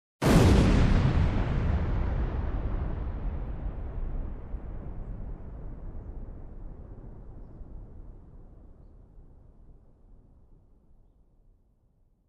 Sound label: Bomb Explosion